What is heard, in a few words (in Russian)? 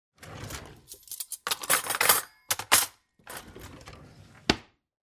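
with cutlery, cutlery put down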